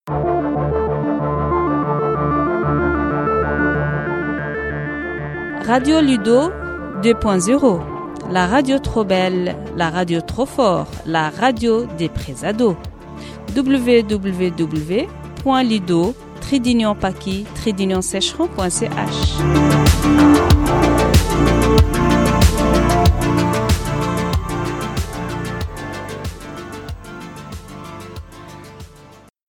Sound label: Jingle